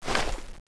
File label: sg552 draw, weapons